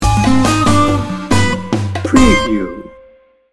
cafe cubano sting bumper

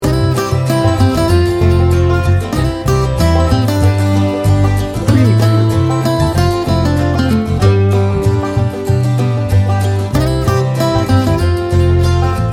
old time country folk

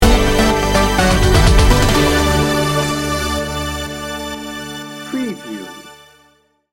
dreamy dancer sting bumper

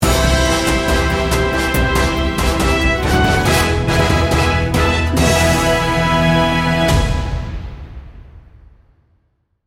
triumphant heroes sting bumper

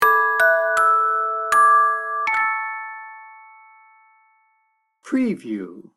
brahms lullaby sting bumper

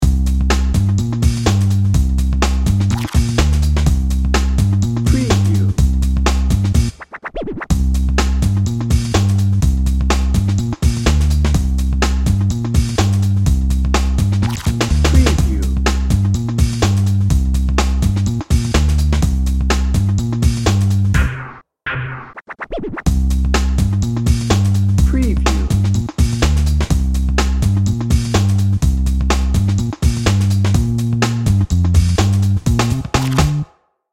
big city hip-hop dnb

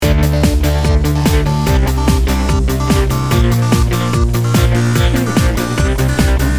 extreme action loop

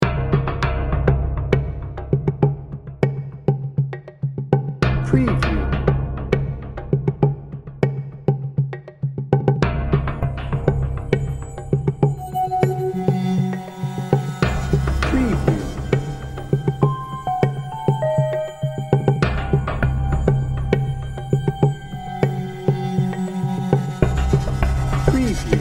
evil intention

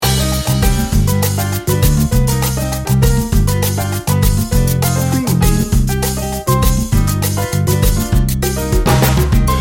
chips and salsa loop

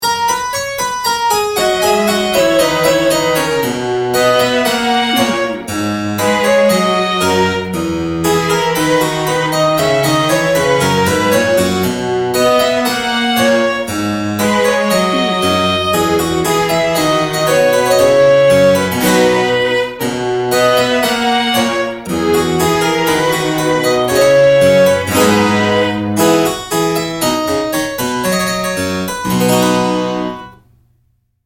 regal dance

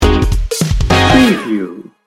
funk in da house sting bumper

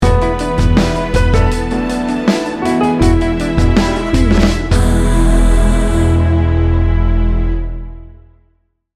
love wins sting bumper

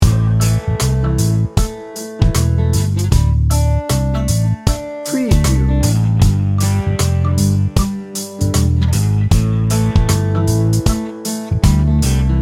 country swamp loop

